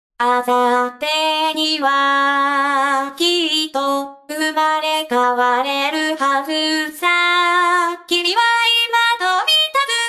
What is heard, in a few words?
chorus